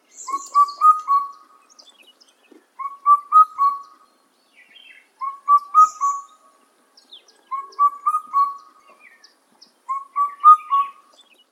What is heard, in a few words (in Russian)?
indiyskaya kukushka cuculus micropterus, zvuki kukushki